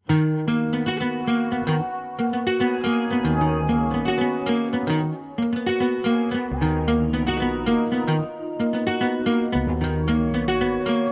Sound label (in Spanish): Guitarra